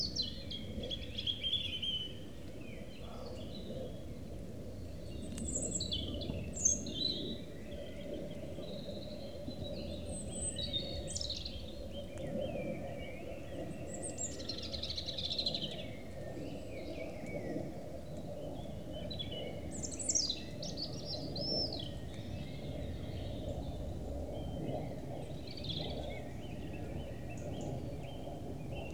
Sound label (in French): avion; pnr gatinais francais